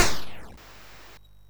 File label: MOS6581R2, sid; Defenders of the Earth